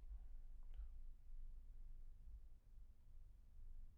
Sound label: Bass Room